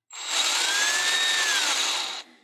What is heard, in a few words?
drill revving; SoundsCrate